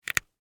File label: Rope Cut Twine, Industry, Rope, Cut, Twine; Hand Tools; Sound Effects:Tools; BlastWave